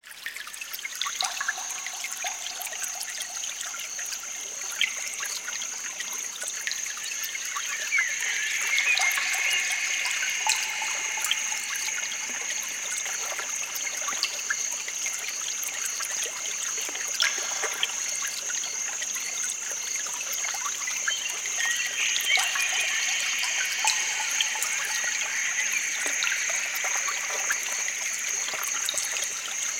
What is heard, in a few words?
countryside enchanted night pure nature sound; green soundscapes